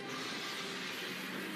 roar; Meganulon SP

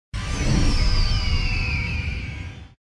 Hawk monster roar

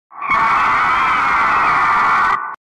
roar; Volga

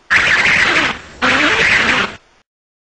Roar, King Crab; Garoborg

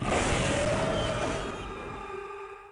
Roar; Dagahra; Heisei

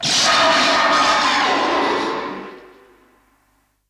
SFX; Mecha-King Ghidorah

